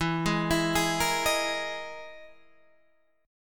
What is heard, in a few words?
E-Major Sharp 11th E, Guitar Half Step Down; EM#11